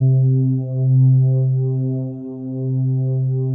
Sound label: PAD, DIGI-PADS